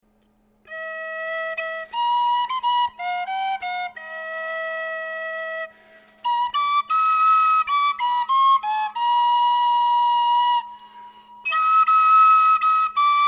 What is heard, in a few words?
whistlers brass d penny whistle w echo; waltons brass d